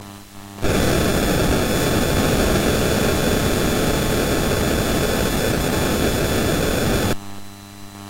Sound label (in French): telephonie 4G LTE liaison montante